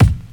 00s thick hip hop steel kick drum sample a sharp key